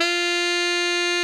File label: TENOR, Saxophones; Greytsounds - Philip Wolfe David Crozier's Saxophones; TENOR SWITCH; FFF F4